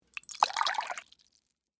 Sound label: Water Pour Chalice, Into, Metal, Cup; Pouring; BlastWave